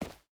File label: footstep hide dirt run; Rust